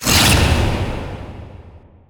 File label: rupture cast; weapons; dota; bloodseeker